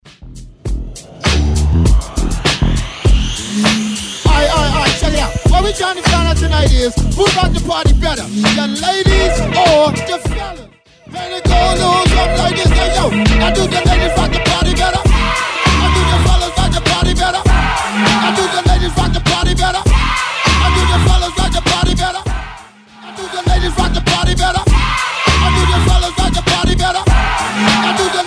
Party Break 100bpm DIRTY

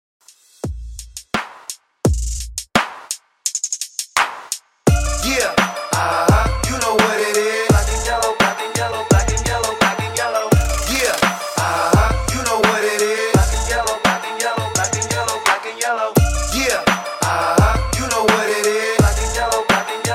85 Bpm; djyan; Redrum

